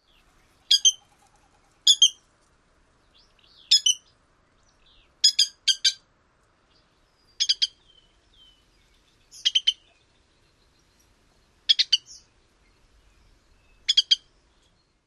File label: two and three parted calls immature